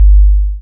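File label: 50 HZ BOOM; PERCUSSIVE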